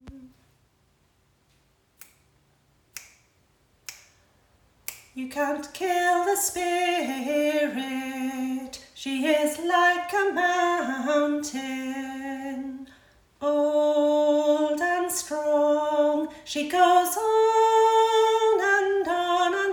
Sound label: melody